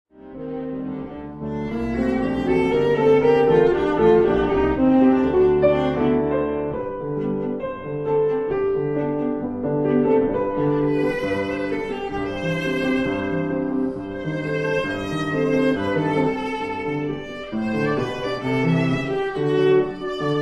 Romanzen For Viola Piano Allegretto semplice; Kiel; Op 69

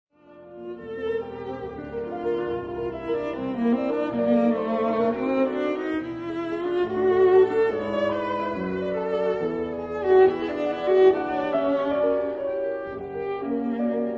Sonata for violin & piano in A major Allegro

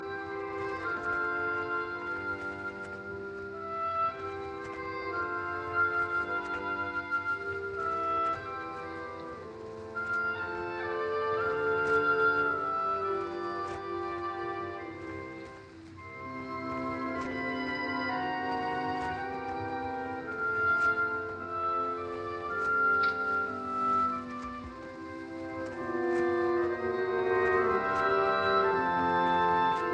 Violin Concerto in D major Adagio; Brahms; Op 77; Joseph Szigeti

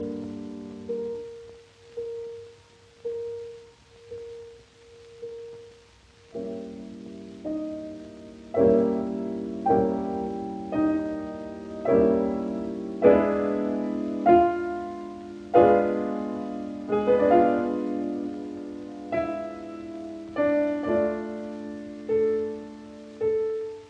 Piano Sonata in D major Largo e mesto; Op 10 No 3; Claudio Arrau

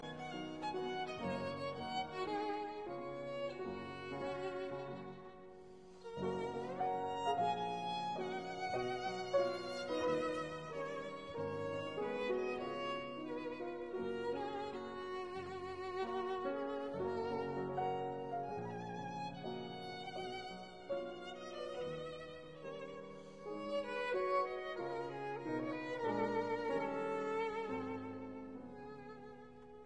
Humoresques in G flat major; 8 Humoresques Op 101 No 7; arr F Kreisler

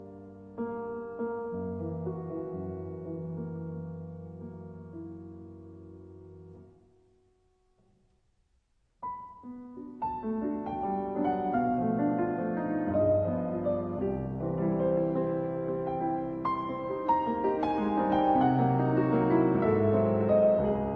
Preludes in G minor Andante; Op 103

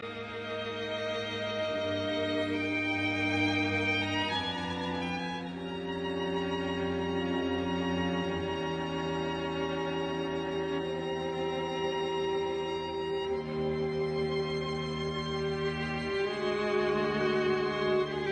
String Quartet in C major, Introduzione Andante; Beethoven; Op 59 No 3; Rasumovsky

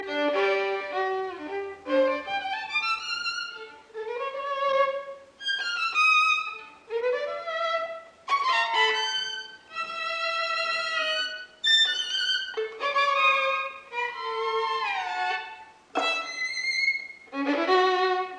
Violin Sonata BB 124 I Tempo di ciaccona; Bartok

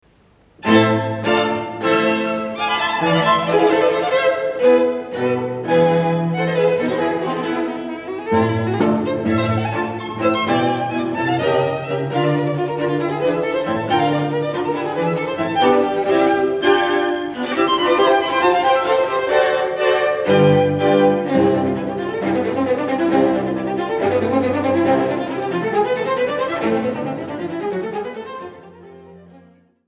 String Quartet in A Minor Op.41 No.1 IV. Presto; Schumann

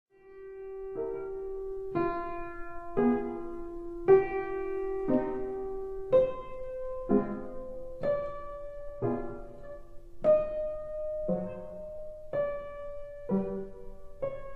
Etudes for piano F minor

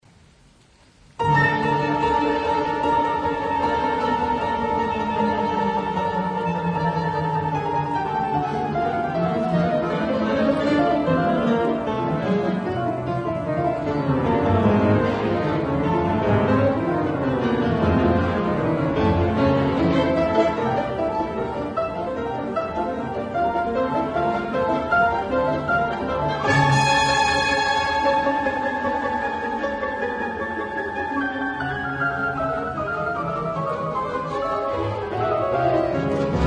Piano Concerto in G minor, Scherzo Vivace; Prokofiev; Piano Concerto No. 2

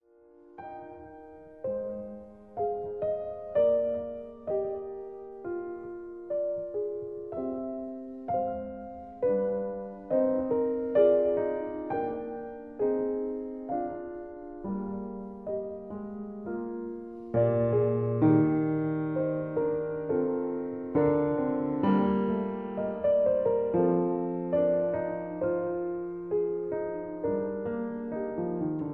Clavier, E flat major Prelude; The Well-Tempered Clavier

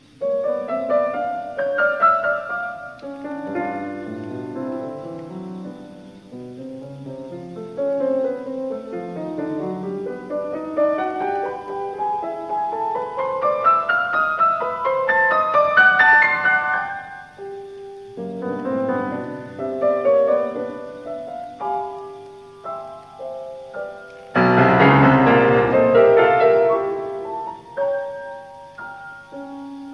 Variations in C major on a Waltz; 33 Variations; Op 120; Variation 18

